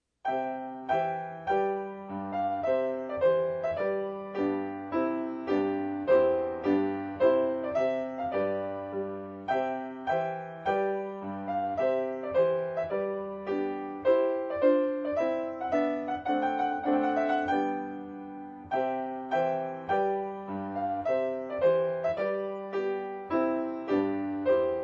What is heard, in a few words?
Trumpet Tune, piano